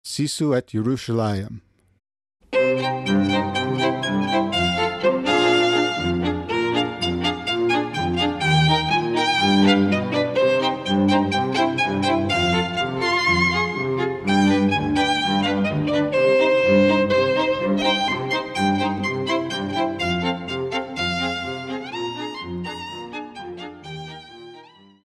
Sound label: sisu, string quartet